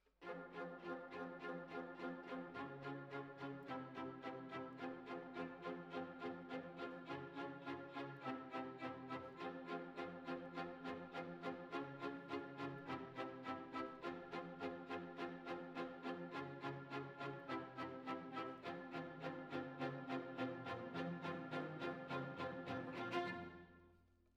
Violins Over

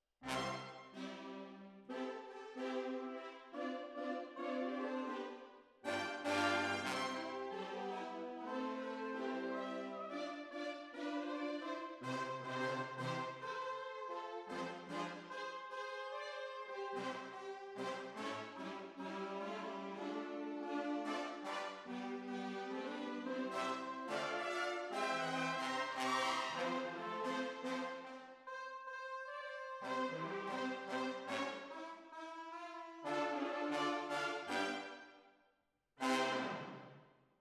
Hall

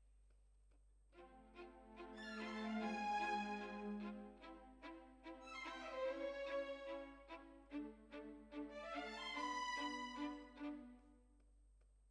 Violins, jazz for piano

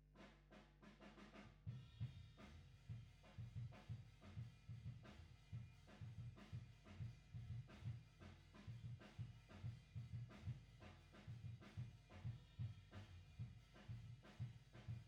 GTR B

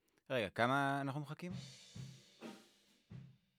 Voc

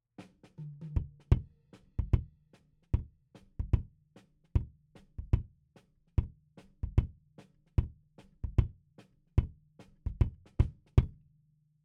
Kick In

